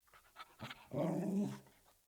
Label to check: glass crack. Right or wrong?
Wrong